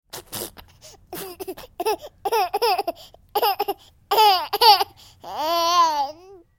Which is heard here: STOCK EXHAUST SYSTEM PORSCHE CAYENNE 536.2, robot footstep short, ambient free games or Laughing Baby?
Laughing Baby